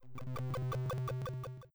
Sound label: portal loop